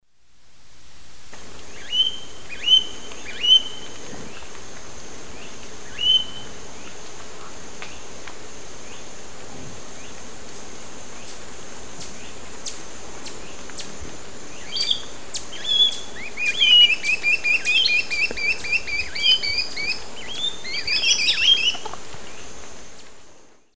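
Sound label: Accipiter superciliosus, calls pair playback; S. America; Eastern Lowlands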